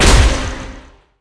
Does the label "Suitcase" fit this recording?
No